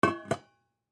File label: Coffee Pot Set Down, Coffee Pot Place On Stand; Appliances; Household; Blastwave